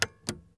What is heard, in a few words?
Gear Foley Reel to Reel Button; FX; Cymatics; Eternity Sample Pack